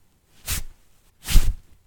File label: Tying Cloth Blindfold